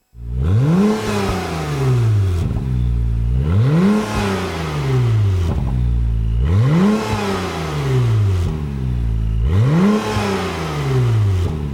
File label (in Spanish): Sonido del motor Honda NSX